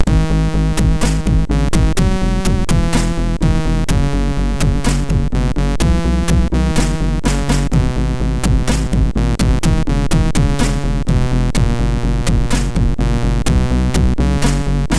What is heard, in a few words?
Slimy Depression, MOS6581R4; hvsc